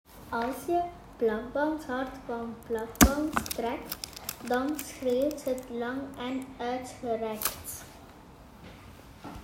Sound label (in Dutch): plakband